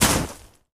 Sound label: Bodyfall Wet Snow; SFX Kit; Bodyfalls